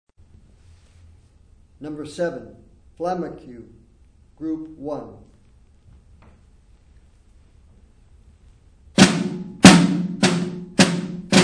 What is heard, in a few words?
snare rudiment